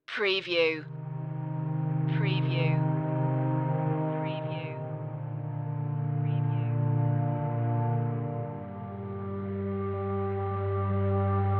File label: tones loop